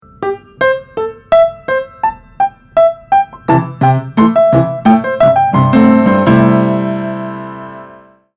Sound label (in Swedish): Jingel Am7 med komp; Jinglar flygelfonden